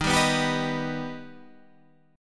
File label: D sharp Augmented D sharp, chord